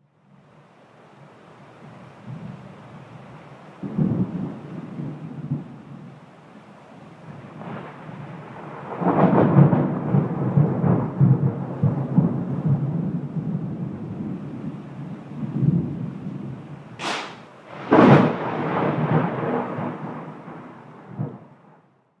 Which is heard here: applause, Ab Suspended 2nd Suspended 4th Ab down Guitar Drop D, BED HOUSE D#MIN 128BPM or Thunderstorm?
Thunderstorm